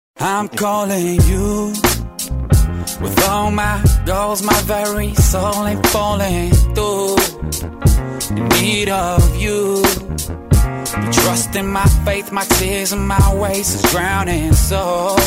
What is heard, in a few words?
Ringtone Mobile